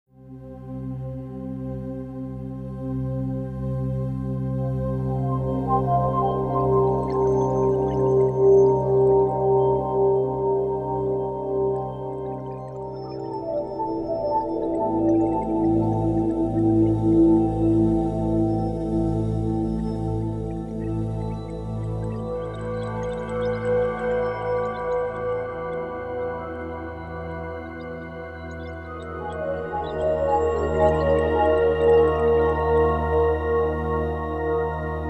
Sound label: pad; Myst III Exile